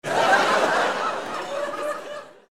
laugh

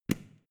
switch small turn on hard

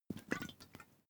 hot-water bag glug

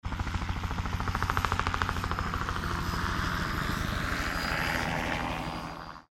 helicopter passing by

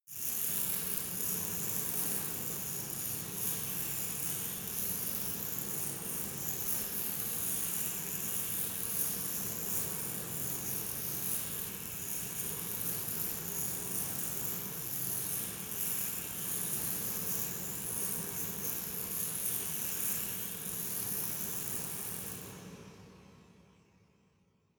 resonator constant

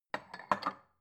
bowl shelve soft

